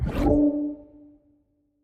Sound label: Training Ring Player Through; Sports